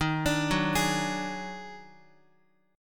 Eb Minor Major 11th, down, Guitar; EbmM11; arpeggiated; JGuitar

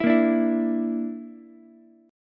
Bb Major Flat 5th Bb down Ukulele, chord; strummed; JGuitar

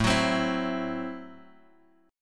A Minor 6th A, Chord; Am6 Chord {5 X 4 5 5 X} strummed

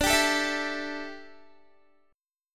D Suspended 2nd Suspended 4th D down Guitar

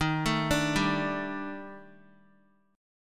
Eb Major 7th Flat 5th Eb Guitar 4F 4A 5C 5G 5C 6E; EbM7b5; arpeggiated; JGuitar

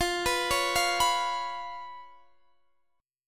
C sharp 7th F, chord

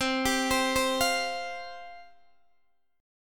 C Suspended 4th C Guitar DADGAD; down; chord shape

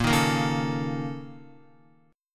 Bb Minor Major 7th Double Flat 5th, Guitar; BbmM7bb5; strummed; JGuitar

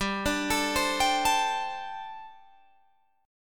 G Suspended 2nd Suspended 4th G, Guitar, Drop D; chordshape; down

